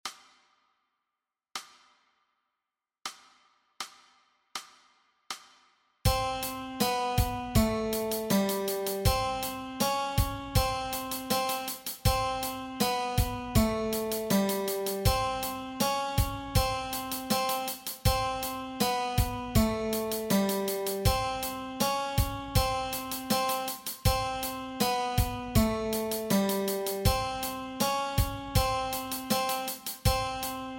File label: Guitar Exercise